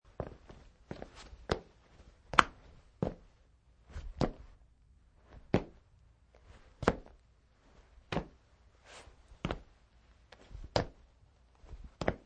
Footstep - Man wearing jeans and hiking shoes running on wooden floor surface; Footsteps; Human; Running2; Footstep Sound Effects